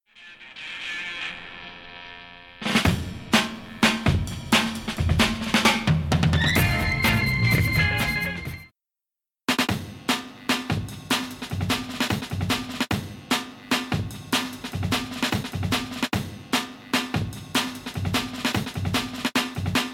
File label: Break